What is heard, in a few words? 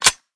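Equip Change Handle Forward; Valorant; frenzy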